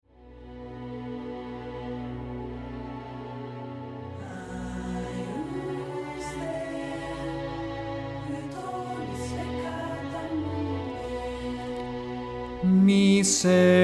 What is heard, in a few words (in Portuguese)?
soprano; Agnus Dei